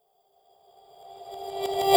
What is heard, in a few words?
Pluck Reverse G